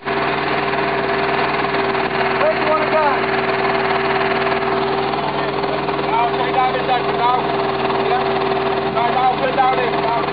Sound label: jackhammer